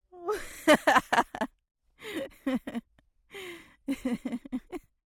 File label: laughter female 30s american aww nominal tapering staggered breathy; Sonniss GDC